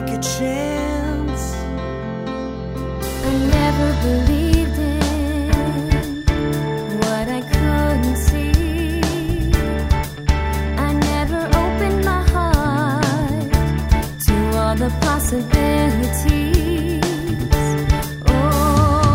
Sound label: Vocal